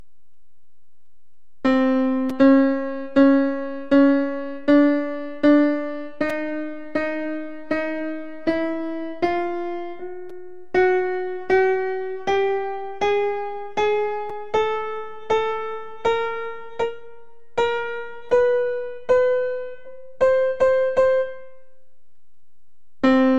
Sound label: sruti; kabir